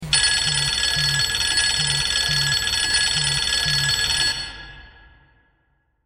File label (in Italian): campanella